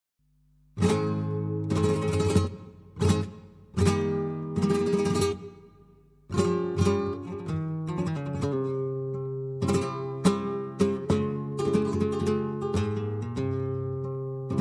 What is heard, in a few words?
tientos, flamenco